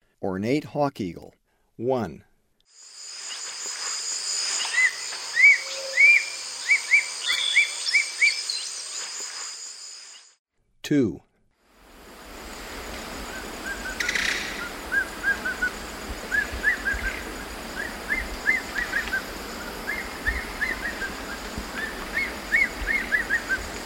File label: Ornate Hawk-Eagle; S. America; Eastern Lowlands